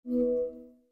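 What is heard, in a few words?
operating system Mac OS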